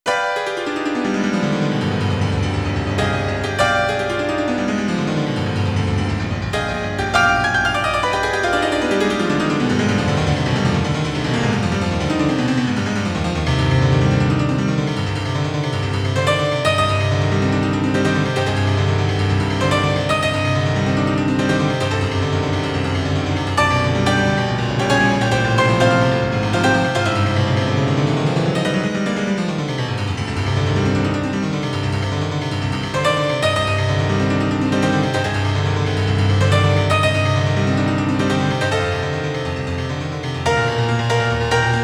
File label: Piano, Revolutionary Etude; Natsuiro no Sunadokei; Kaho's Piano; F. Chopin